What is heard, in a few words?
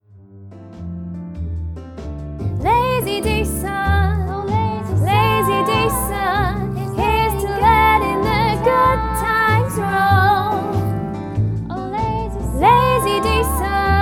Live vocal duet for 2 treble voices